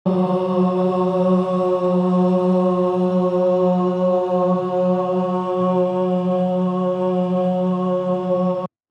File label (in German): pitch fis